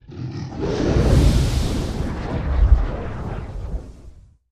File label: Dragon Roars Breaths